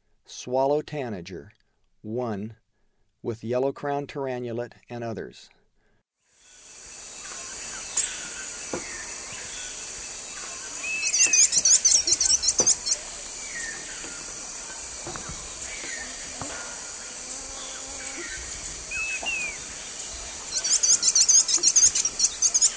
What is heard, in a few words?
Swallow Tanager; S. America; Eastern Lowlands